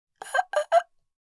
Adult female shortness of breath; Breathing Sound Effects

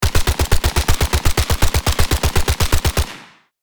FN SCAR assault rifle full auto fire; gfxsounds

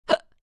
Short female hiccup

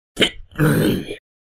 Man cough clearing throat

